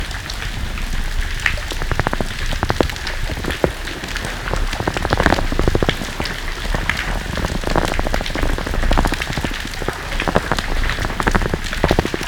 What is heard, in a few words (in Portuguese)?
Sons rotadores clicks, golfinho rotador